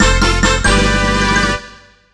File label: jingle; cookie run